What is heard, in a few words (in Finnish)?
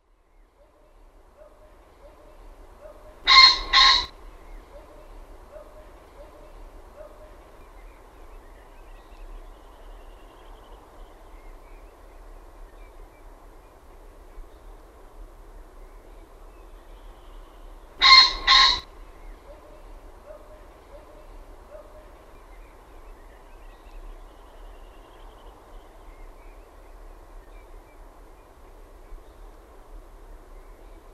FASAANI; LINTUAANITYKSET